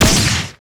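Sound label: FG SHOTS, future gun; Total Control - Action Cutz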